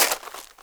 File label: GRAVEL, FOOTSTEPS; 1000fx